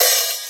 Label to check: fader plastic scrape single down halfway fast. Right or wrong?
Wrong